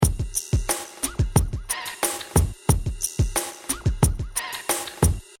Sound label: Hip Hop Drum Loop 90 Bpm Screechy Straight; Drum Loops